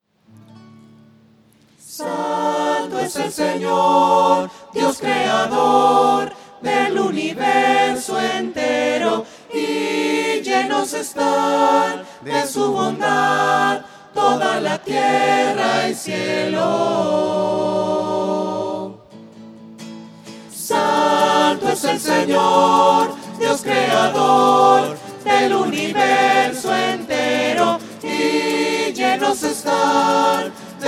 santo fresa voces; misa

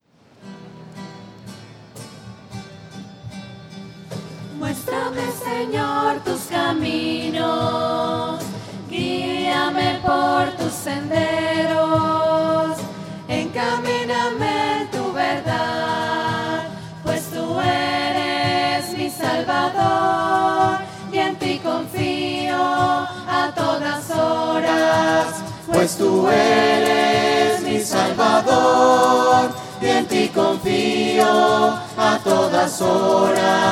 voces; muestrame sennor tus caminos; misa

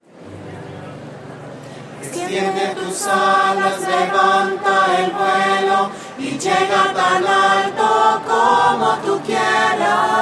extiende tus alas voces

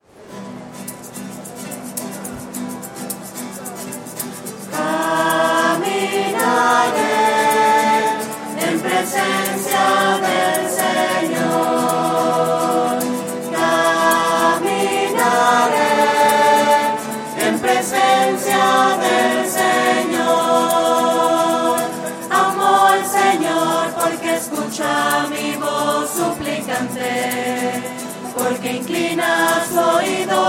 caminaré voces

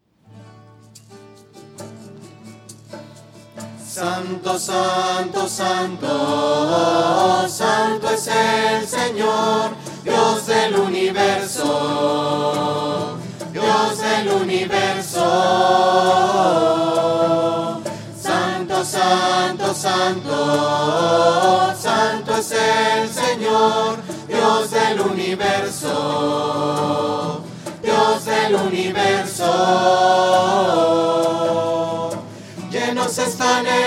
voces; santo panano; misa

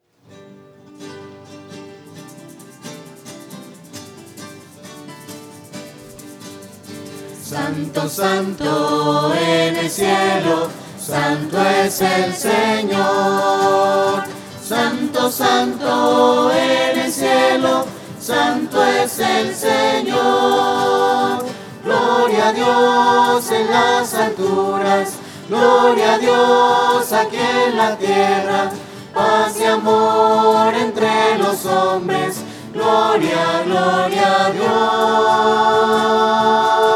santo santo voces; misas 2012

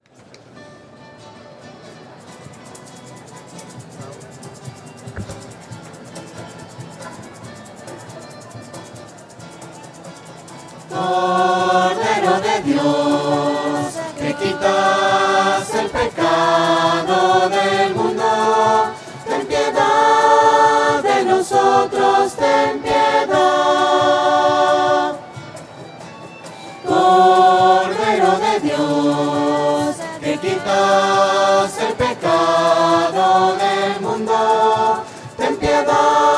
voces; misas 2012

